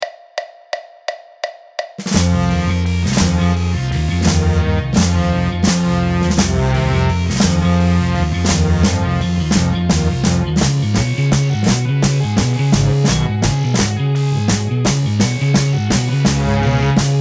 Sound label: America with snare